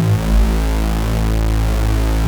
OB A2, Rare Synthesizer; VOX; Hollywood Edge - Giorgio Moroder Rare Synthesizer Collection

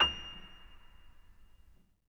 Acoustic Piano

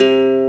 ACGUIT E1, BassGuitar; AKAI S6000 CD-ROM - Volume 1

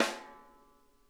SNARE; Sonic Reality; Interactive Drum Kits

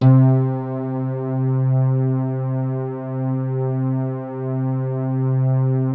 HEAVEN C2, SYNTH PLUCK; Music Store - Claudius Diemeter Schwerlos

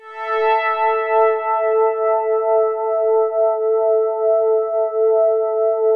5th soft A3; SPACIOUS; Music Store - Claudius Diemeter Schwerlos; SPACIOUS 1

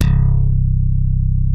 DYNA HARD C2, DIGITAL BASS; Music Store - Claudius Diemeter Schwerlos

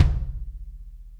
kick, Interactive Drum Kits; Sonic Reality

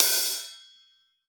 GATE OH; USB Soundscan vol.10; AKAI; 90s sample CD; GATEKIT 1

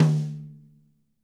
mid tom; 90s sample CD

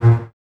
A#2 legato, Basses; BSS f Legato; Miroslav Vitous - String Ensembles

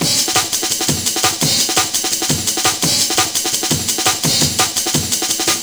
Kickin Break, Drum & Bass; Beats & Breaks; Sonic Foundry; Fluid Dynamics Drum & Bass

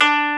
Koto D3; Proteus 2000; Multisamples; Digital Sound Factory Proteus 2000

